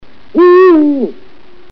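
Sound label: eagle owl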